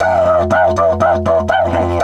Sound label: 120, Didgeridoo